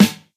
clean snare g key, snare sample tuned to the G note. Loudest frequency: 1226Hz